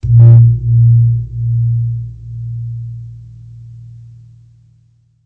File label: Soft gong